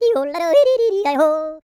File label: YODEL; ILIO; Vocal Planet